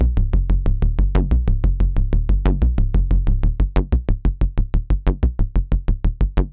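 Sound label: Filter High Resonance; ACL System 1 Seq1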